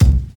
clear kickdrum sound C key, kick sample tuned to the C note